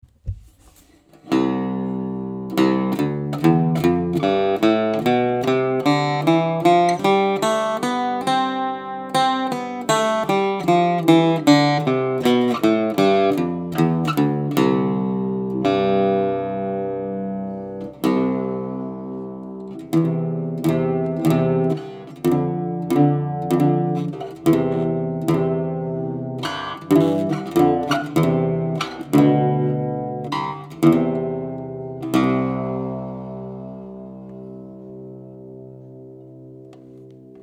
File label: scale, cello